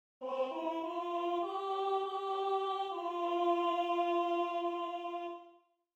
alto

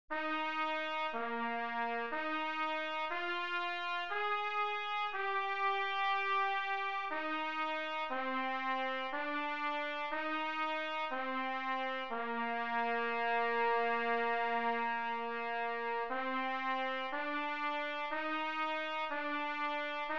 trumpet

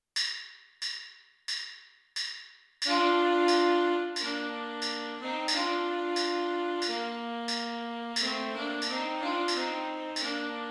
tenor sax

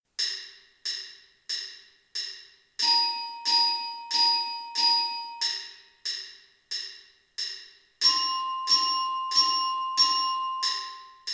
orchestra bells

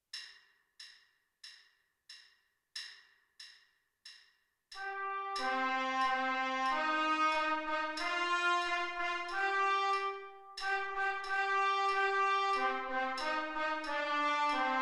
trumpet

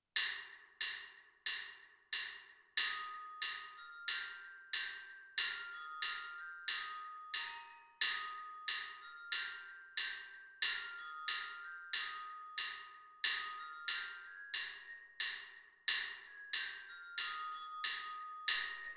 orchestra bells; alouette

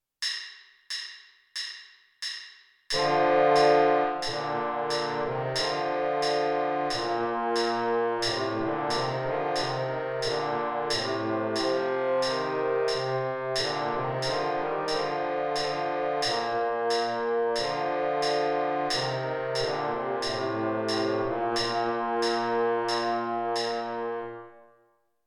the conquering hero trombone baritone parts a b

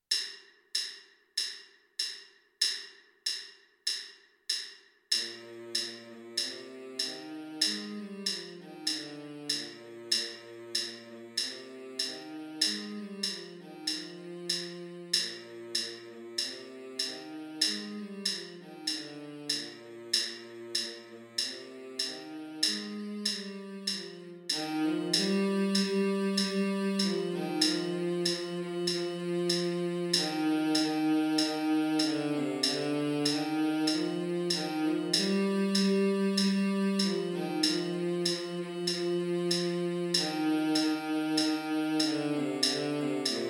sawmill creek baritone sax

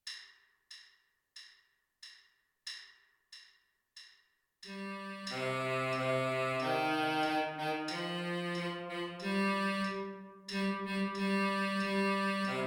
baritone sax

